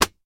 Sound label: Book Slam Slap Book